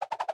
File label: Projectile Loop; Zombie Miner